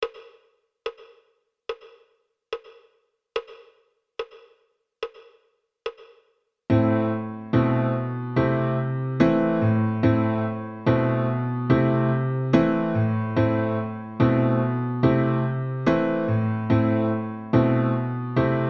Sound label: piano solo